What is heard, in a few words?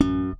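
Yamaha thump F#2, Bass; Masterbits CLIMAX 7; PATITUCCI BS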